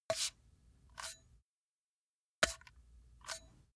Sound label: metal pole